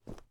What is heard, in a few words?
Snow